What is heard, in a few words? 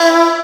Vocal Oneshots; Vengeance Samples; Vocals